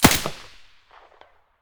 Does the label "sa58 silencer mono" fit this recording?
Yes